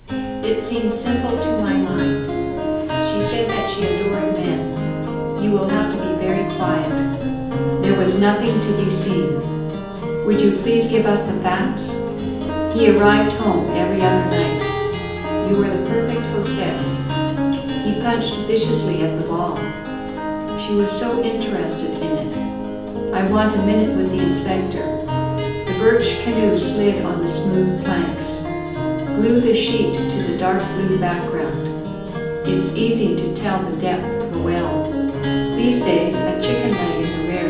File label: gitar